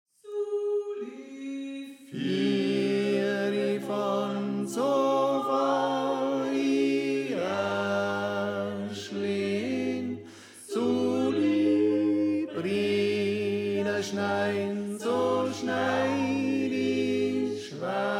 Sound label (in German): Tenor; 58-Soll I Füre Fahrn